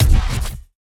Sci-Fi Weapons-Source Sounds-Futuristic Sci-Fi Shot